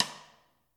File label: Prac Sidestick